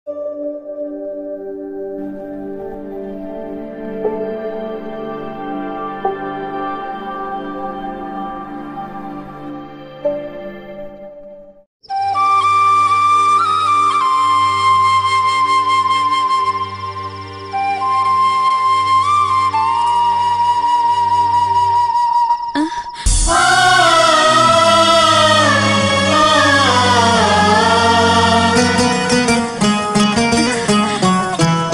odia heart touching ringtone odia love ringtone broken heart bgm